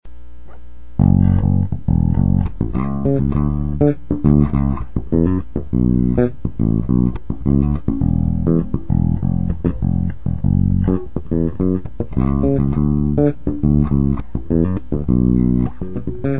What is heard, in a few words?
tobias groove, baixo